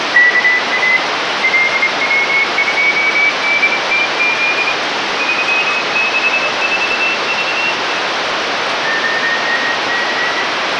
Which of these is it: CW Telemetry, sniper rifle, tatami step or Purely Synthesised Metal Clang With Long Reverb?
CW Telemetry